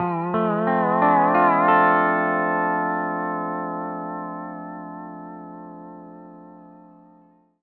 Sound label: PD LOOPS 140, Whole Lotta Country